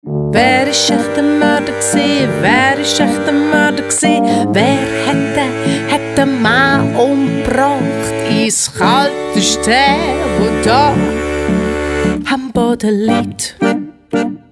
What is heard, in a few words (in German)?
Tango im Lift